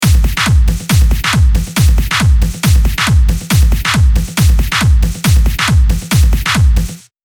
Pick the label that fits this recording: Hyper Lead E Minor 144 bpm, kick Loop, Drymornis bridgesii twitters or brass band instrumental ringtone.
kick Loop